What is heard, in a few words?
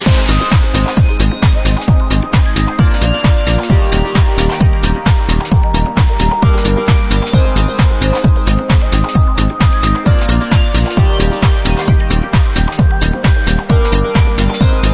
original instrumental mix, disco